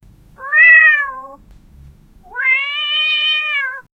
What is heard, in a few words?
cat sound, purr